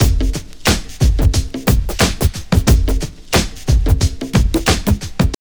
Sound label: TRIP 90, trip loop